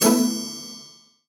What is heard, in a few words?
character upgrade stat